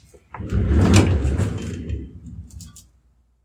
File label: caster chair